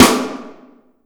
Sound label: Snare